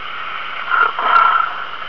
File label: belch